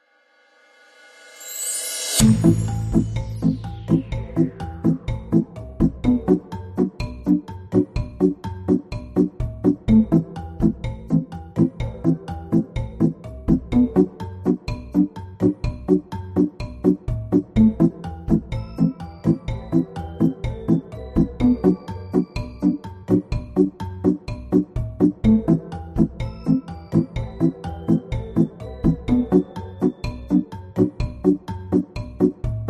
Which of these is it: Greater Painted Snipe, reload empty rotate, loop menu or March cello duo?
loop menu